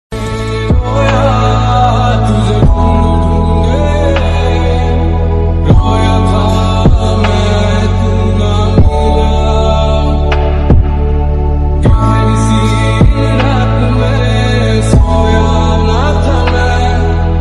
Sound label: sad emotional ringtones broken heart ringtone sad ringtone ringtones sad; wafa